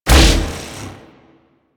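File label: Madurai Contamination Wave Projectile Impact B; Warframe; Focus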